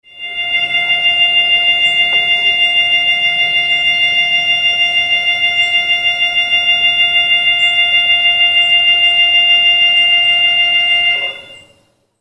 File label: burglar alarm